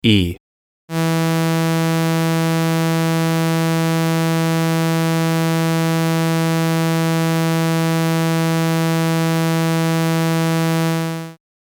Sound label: trombone; jargon tunings; wind